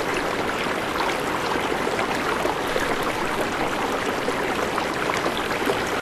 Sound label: water flowing